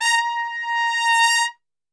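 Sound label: A#3 trumpet, Brass Super Section; Best Service; TRP SWL; 90 s Sample CDs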